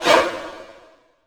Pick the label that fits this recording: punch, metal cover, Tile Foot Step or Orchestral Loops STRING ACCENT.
Orchestral Loops STRING ACCENT